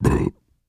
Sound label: Deep Burp; burping